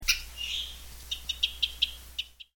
western

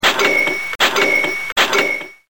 cash reg

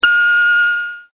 answering machine

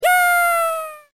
fall

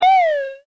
Slide Down

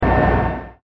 fan loop

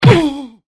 poing

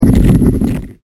lift

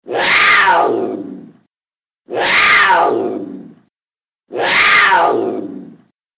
wildcat

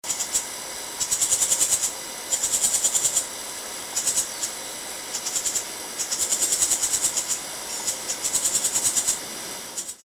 chirpy grey bird

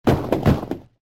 Shotgun Blasts

